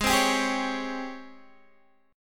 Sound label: G sharp Major 11th, Guitar, DADGAD